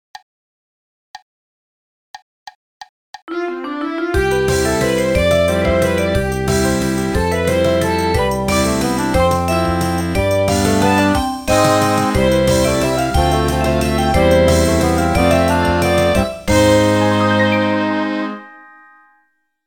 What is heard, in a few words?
NHK Opening MIDI